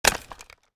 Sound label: ak47 reload shoulder; Tacz1.1.5+; RSWS 1903